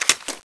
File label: m79 aim on; weapons